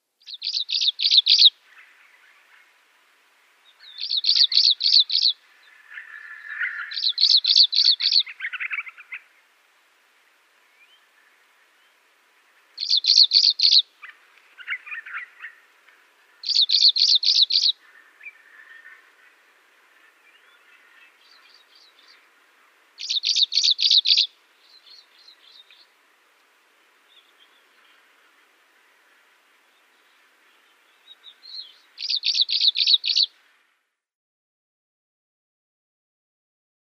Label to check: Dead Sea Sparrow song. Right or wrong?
Right